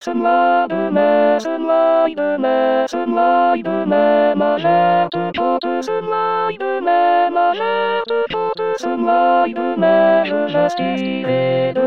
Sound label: alto; rimailleurs